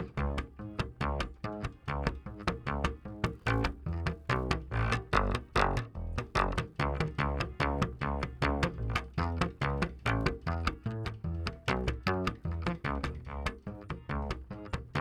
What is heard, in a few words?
Bass DI